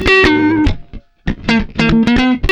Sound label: lick high; Gtr Chords&Licks; PlatinumPhattCD2; E-MU Producer Series Vol. 8 – Platinum Phatt